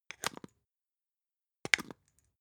spice jar smooth close creak; industrie wirtschaft; gastronomie

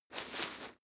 step grass turn

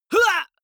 male fight hit shout; comedy-cartoon; soundpool

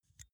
pull out xlr extension; objekte; elektronik

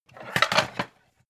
pan stack slide hard; objekte; topf

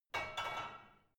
barbell only replace soft

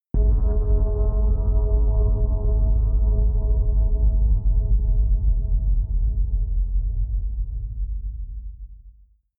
synth hit sub space; whooshes rises

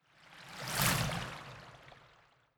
whoosh

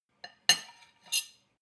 cutlery on plate clatter and scrape, gastronomie; berufe; soundpool

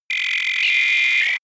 energy beam, laser; krieg-waffen; soundpool

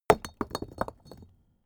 stone small impact

